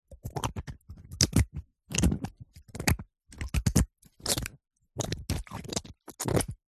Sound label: human hands wet rub movement together